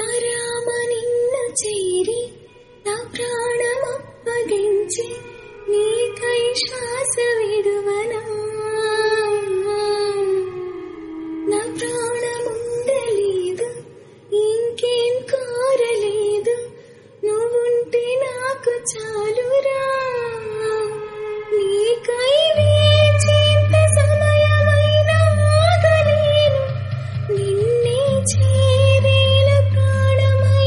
song female; Sita Ramam

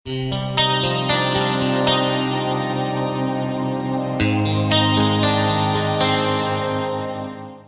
Love Guitar Sms

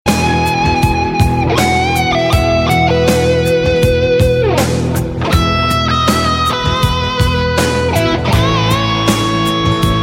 Cool Guitar Ringtone

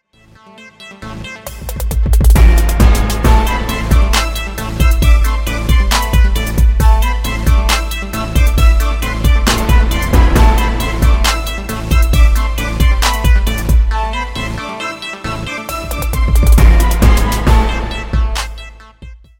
Hip Hop Beat

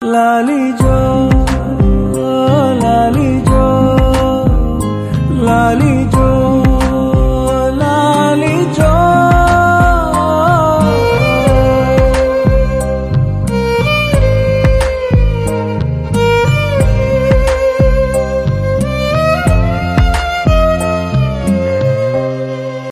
Telugu male

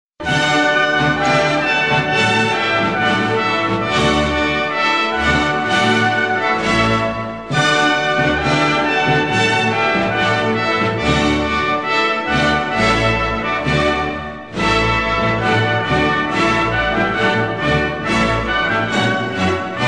Ode To Joy Loud

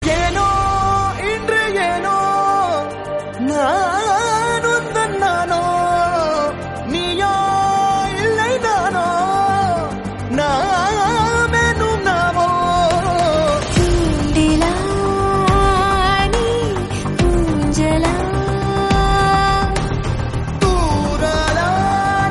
Tamil song; Thirumanam Enum Nikkah

